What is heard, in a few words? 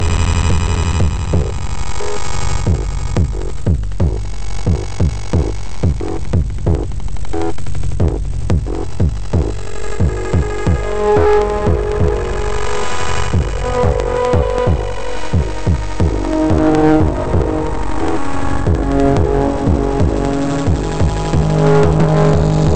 PCM 18000Hz mono